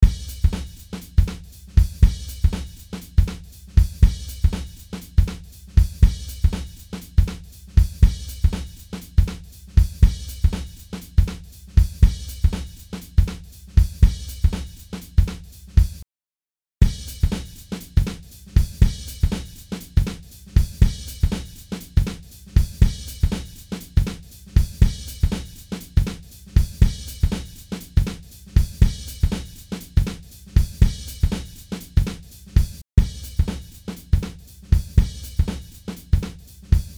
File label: Drums 60Hz Retro Low; Silver 73 Low Clean 73 Low